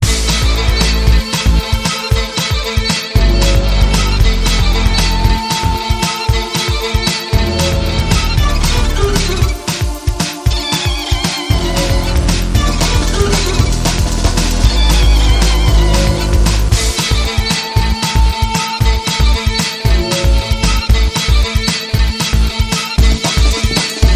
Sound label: Devils Violin